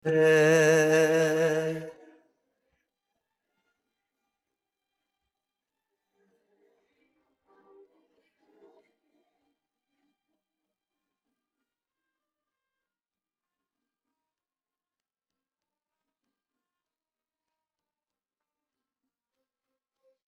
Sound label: vocals